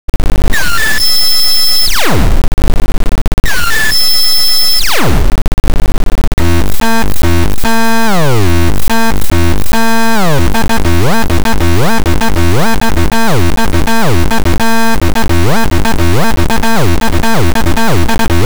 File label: Groove